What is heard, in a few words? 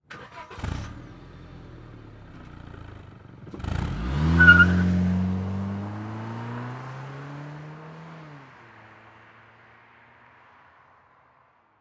Transportation Cars Honda Civic Start Drive Off Fast